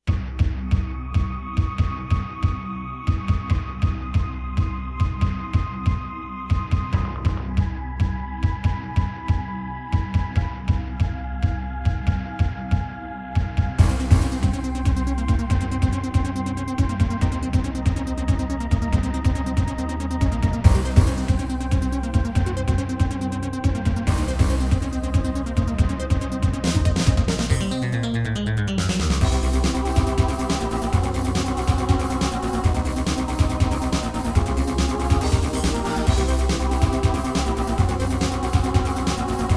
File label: Serdaco Dreamblaster X2GS; BURAN V1.1; sigil doom episode 5